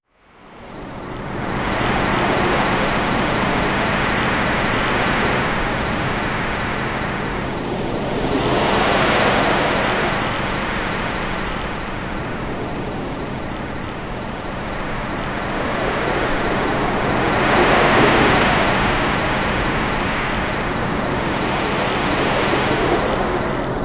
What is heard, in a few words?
ocean waves crashing on, seagulls